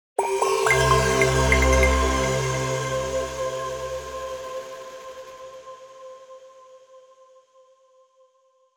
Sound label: soundlogo